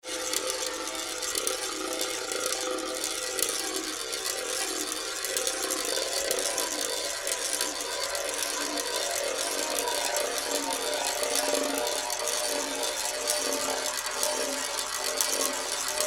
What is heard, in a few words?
murmur